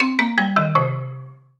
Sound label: MUSIC EFFECT Solo Xylophone Negative; startide macos switch windows gamerip 2017